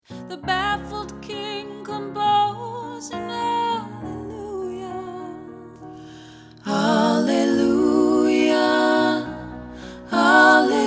Hallelujah All Parts

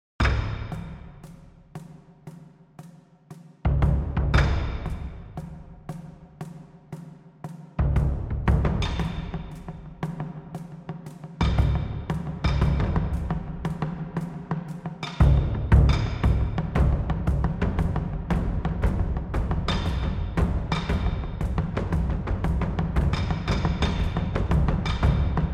Japanese Drum; Percussion, Serious, Driving; Japanese Drum School

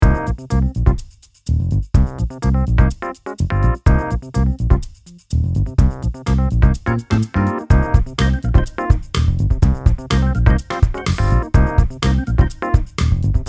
Organelle

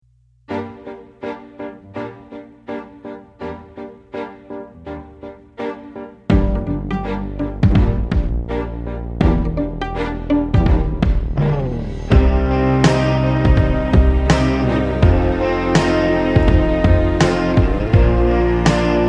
Classical Dark Pop

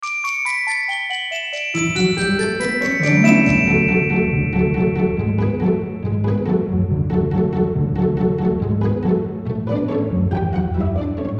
Playful

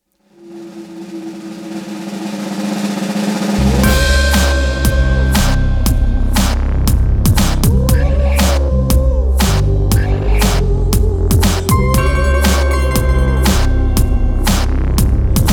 Ghost March Theme (pop)

